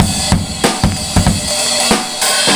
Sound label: Next Beat 94 bpm, breaks; Zero-G Beneath the Planet of the Breaks